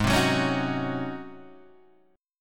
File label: Ab 11th down Guitar Open G